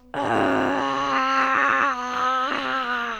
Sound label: long war cry